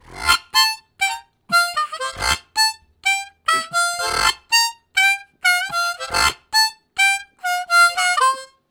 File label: Rolled, Harmonica Riffs; Zero G